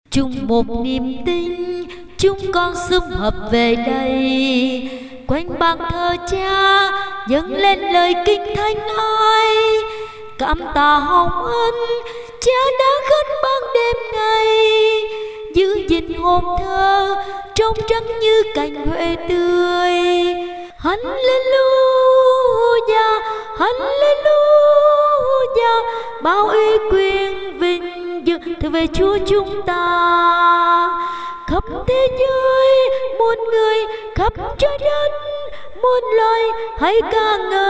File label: tenor basso